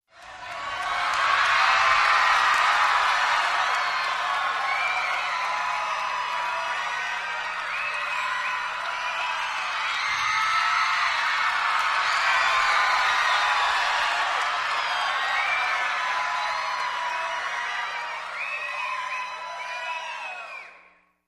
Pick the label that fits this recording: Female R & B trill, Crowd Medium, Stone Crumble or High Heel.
Crowd Medium